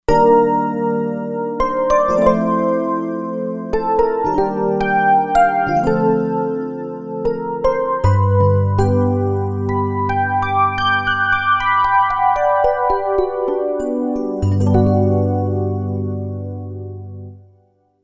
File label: EP Belle, Roland Fantom XR